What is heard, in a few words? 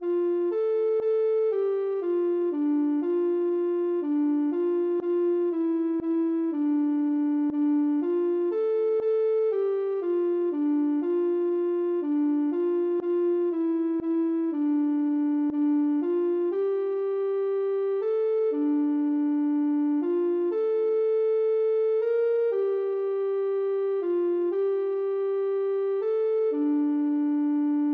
ocarina